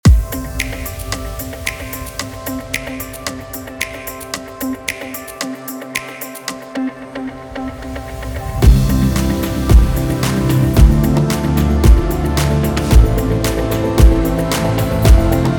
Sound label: Organic Percussion; Black Octopus Sound; Hyperbits; Organic Percussion Toolkit